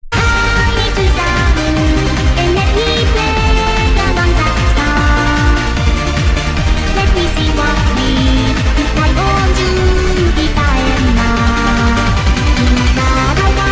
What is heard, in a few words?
techno